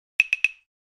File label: Clav, SMS; Alcatel OT-V555 Vodafone 555